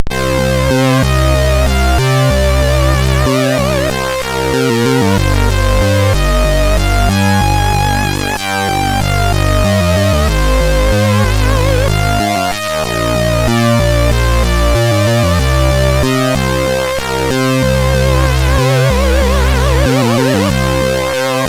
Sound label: sid MOS6581R3